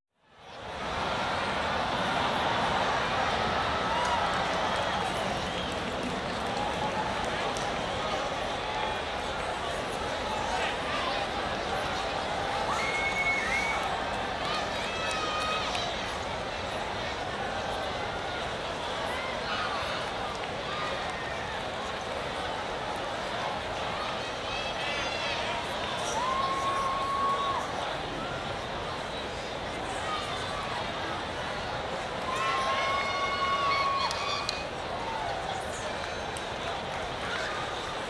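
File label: Crowd Baseball Stadium, Exterior Atmosphere Ambience With People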